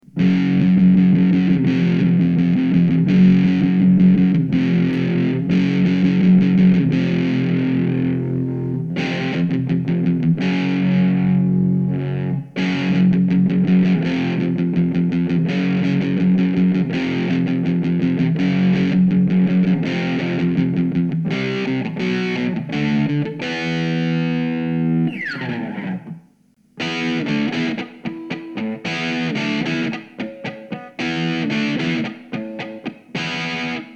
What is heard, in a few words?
MESA TRIPLE RECTIFIER